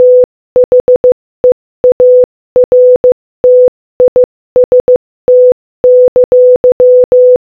THE ARTIST Morse Code Audio

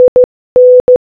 IN Morse Code Audio

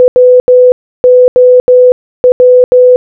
WOW Morse Code

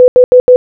H Morse Code Audio

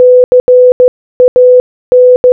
CAN Morse Code Audio

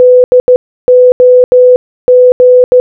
DOG Morse Code

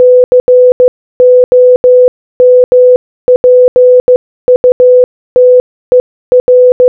COMPUTER Morse Code

